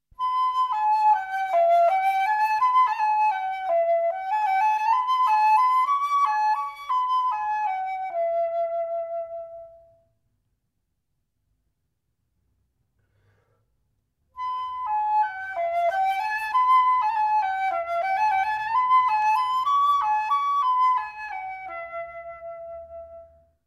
Flauta travesera; Grieg; Tema de Peer Gynt

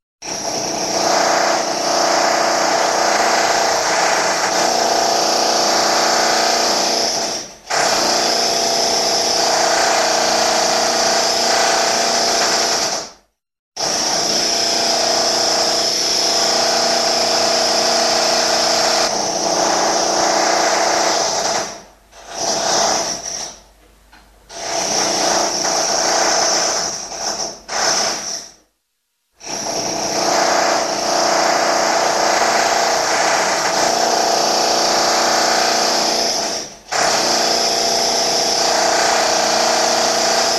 sonido molesto de taladro

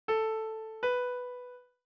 Intervalo Conjunto

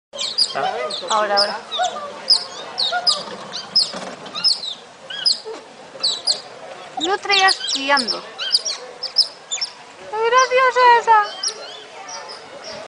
Sonido de Nutrias; sonidos animales

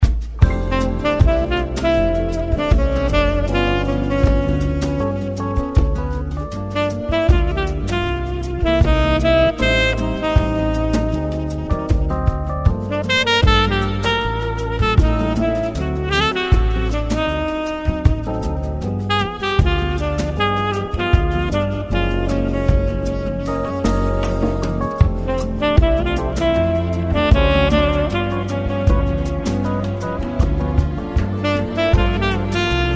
saxo

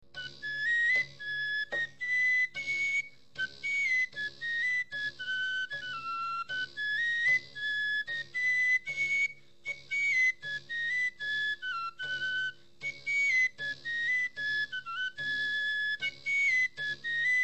Así suena una flauta y un tambor de cuerdas

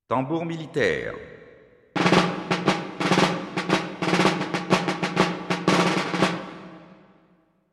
Caja militar

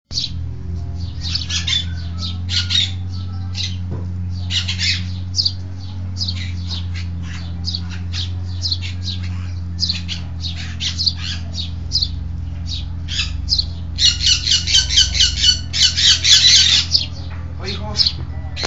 Tortuga caminando

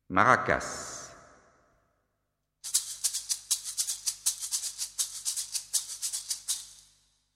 Maracas

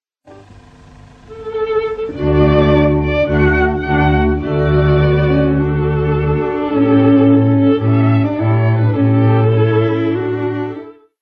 Cuerda frotada

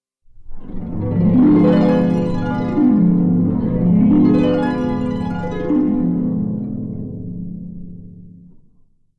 Arpa

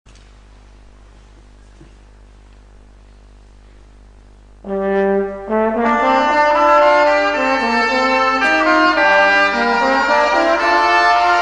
Sacabuche; Francisco Guerrero

